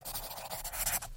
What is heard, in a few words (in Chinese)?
签字; nba; 球探系统